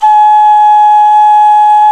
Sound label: clay flute, Afro pipes; Roland LCDP11 Africa VOL-1